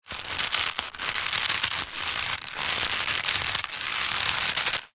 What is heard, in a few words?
rope thick old tension movement creak stress